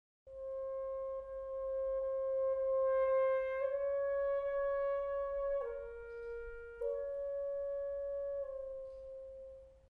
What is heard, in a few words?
no vibrato